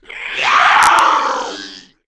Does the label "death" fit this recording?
Yes